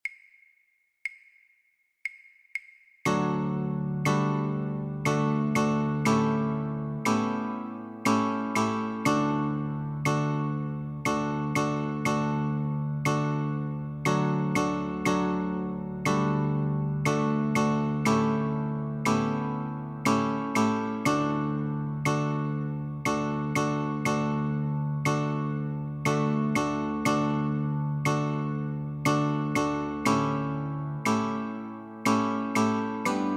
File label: Notes and chords, guitar; Hickory Dickory Dock